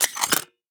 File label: bolt rifle bolt back; Rust